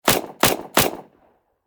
Warfare Submachine Gun Thompson 9Mm Triple Shot Distant Perspective; Machine Gun Sound Effects